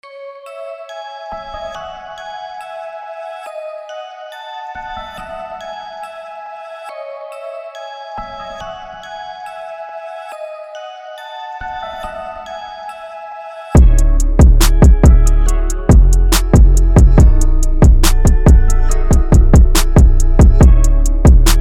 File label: beat